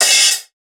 hat wide, Open Hat, Drum One Shots; SD MIDNIGHT Sample Pack; Slate

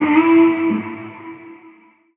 vocal altitude Am; Slate; AURORA Sample Pack; Vocals

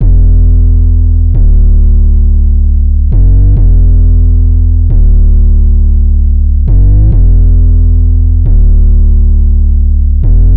808 loop 135 Em; Slate Digital BASSHOLE Sample Pack; 808 Loops

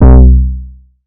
Synth one shot Short Bb, Bass; Slate Digital BASSHOLE Sample Pack

